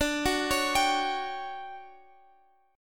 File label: D Major 11th D; arpeggiated; JGuitar